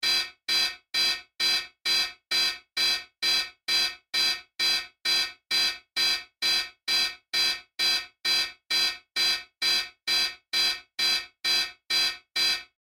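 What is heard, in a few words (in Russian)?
звуковой сигнал наземной радиолокационной сигнализации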